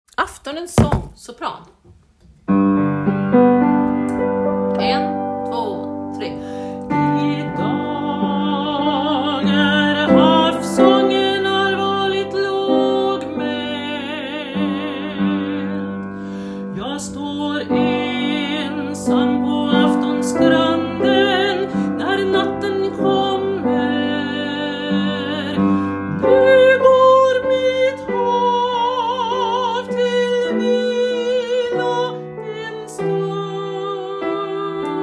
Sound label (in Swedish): sopran; Aftonens sang